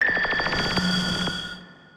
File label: Synth Sting; musicradar